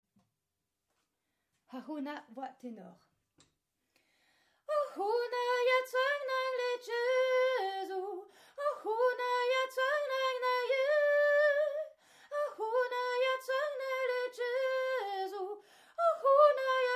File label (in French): tenor